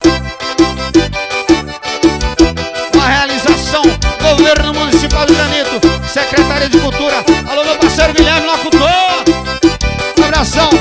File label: clave solo